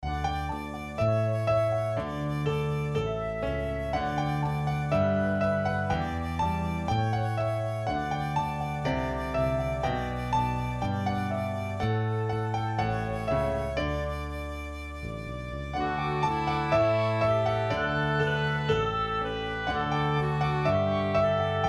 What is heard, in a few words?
Childrens music